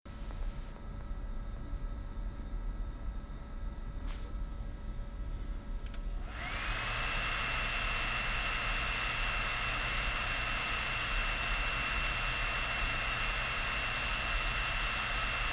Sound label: lenovo m715q noisy fan